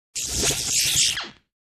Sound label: Crazy Electric Shock